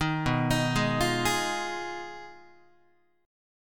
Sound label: B 6th Flat 5th, down, Guitar; arpeggiated